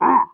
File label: pain sharp; Pyro